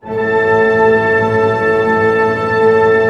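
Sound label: tremolo A pianissimo, orchestra; Sound On Sound - Peter Siedleczek's Orchestra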